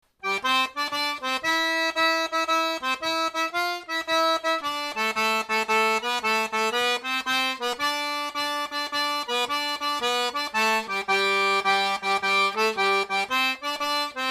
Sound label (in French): la valse de reno vitesse normale